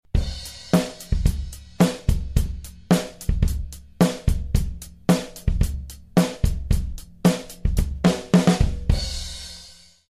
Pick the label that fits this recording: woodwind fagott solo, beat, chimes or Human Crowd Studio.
beat